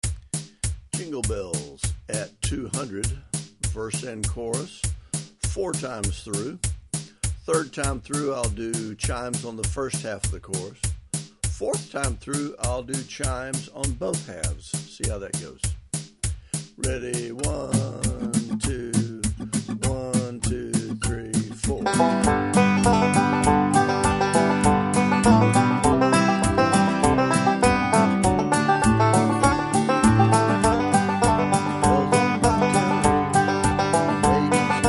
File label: jingle bells, banjo